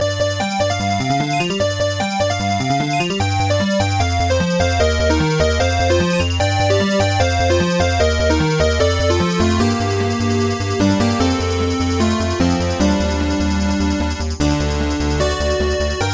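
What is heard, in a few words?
World Music, MIDI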